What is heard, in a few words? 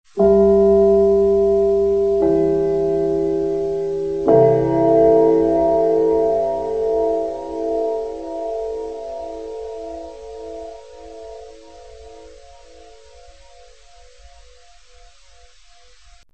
gong; cineplex; metropolis